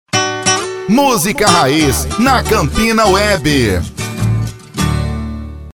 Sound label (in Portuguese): VINHETA MUSICA RAIZ